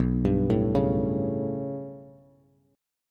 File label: B 7th Suspended 2nd Sharp 5th, down, Bass, Bass Standard; B7sus2#5 arpeggiated; chord shape; JGuitar